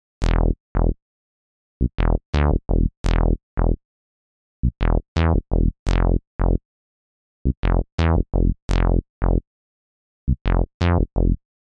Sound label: bass